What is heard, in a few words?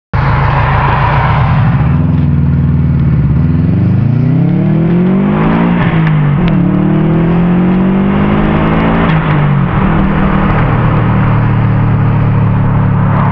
mach 1 fully recirculated